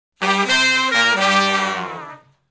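Shuffle Blues Riff (G) unison falloff, Horncraft for R&B Sections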